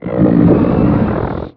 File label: attack muffled double, antlion; npc; Half Life 2; Episode 1